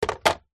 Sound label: leisure toy gun rifle plastic set down on wood floor